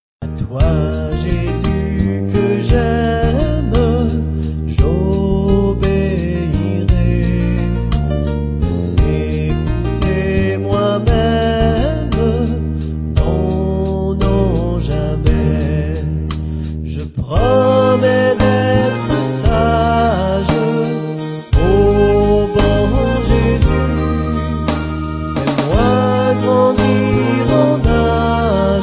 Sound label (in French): chant; de promesse louveteau; louveteaux